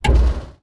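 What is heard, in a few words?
flaps start, plane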